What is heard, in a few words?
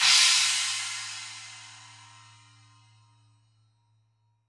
china, drums